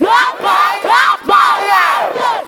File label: VOX FX, Funky Vocals; USB Soundscan vol.18